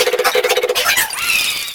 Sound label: Fan Chant; Mario Paint